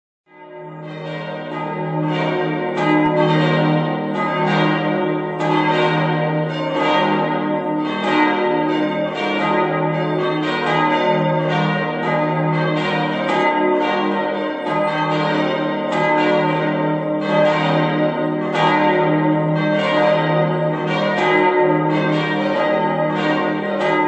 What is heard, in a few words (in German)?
Glocken vergleich Alt Neu